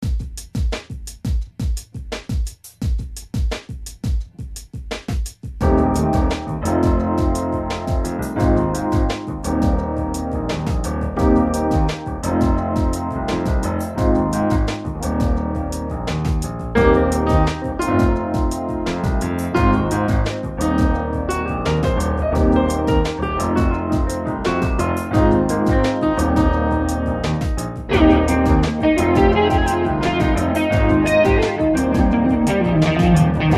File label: Rock Groove